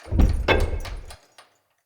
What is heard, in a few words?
Archway Gate Door Close; UnderCity